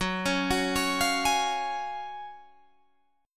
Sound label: F sharp Major 7th Suspended 2nd F sharp, Guitar Drop D